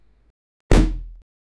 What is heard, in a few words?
a heavy punch hitting a; athletic